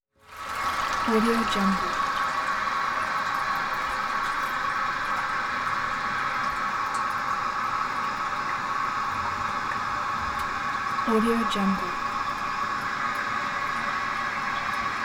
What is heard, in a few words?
liquid water flows down pipe with echo and stops